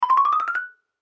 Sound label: Xylophone Ascend; Xylophone Ascend High; Mallets; Blastwave